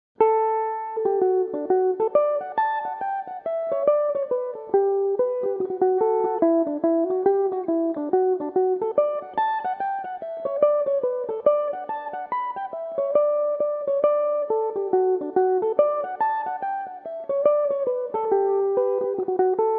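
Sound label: hornpipe d major, mandolin; Liverpool Hornpipe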